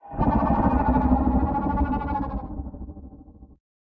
Sand Worm Roar; Lethal Company

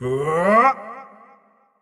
WindUp Long; Marv

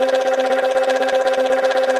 sfx score up

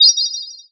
Ingame Bonus Enemy Gimmick

